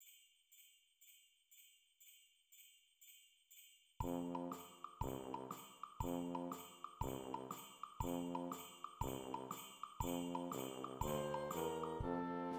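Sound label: alto sax; Jul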